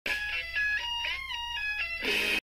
Guitar Riff

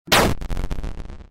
bite sound; fnaf 4

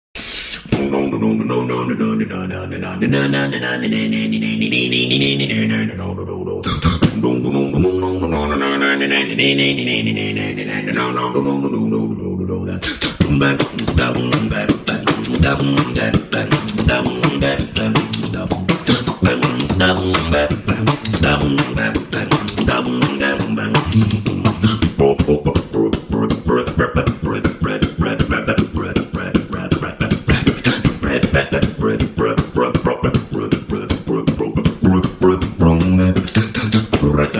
low tetris beatbox; verbalase; made with Voicemod

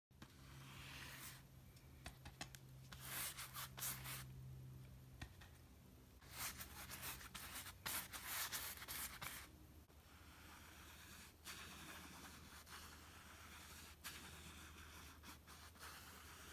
Sounds of brushes on canvas

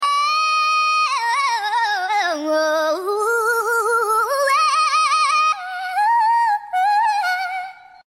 Bear Singing; Lorax

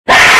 scream

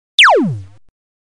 Laser Gun

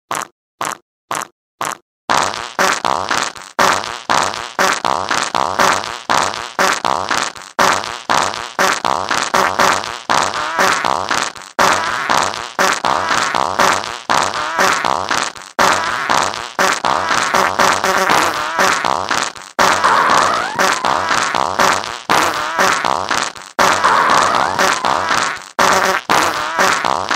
Fart Beat Authentic fart sounds

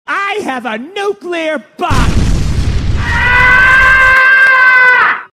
Bomb scream

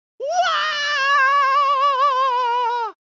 woaaa falling sound effect; mario; woaaa mario falling meme; made with Voicemod

